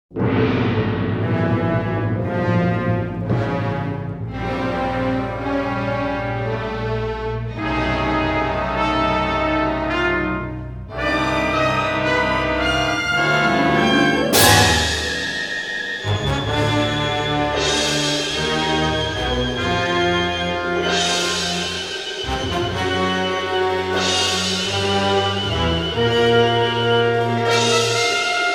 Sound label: Music Creature; Creature From The Black Lagoon; Main Title